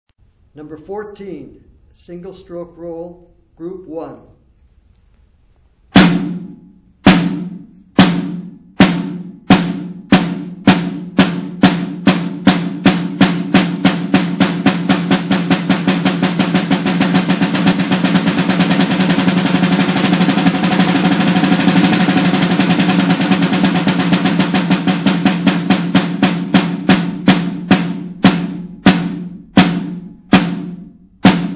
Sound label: snare rudiment